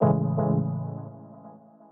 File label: Stabs Double Pulse A, Cinematic Trailer Loops